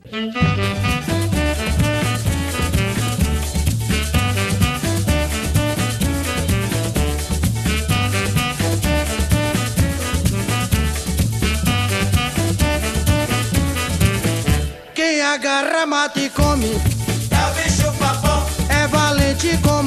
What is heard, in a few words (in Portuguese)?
CARIMBO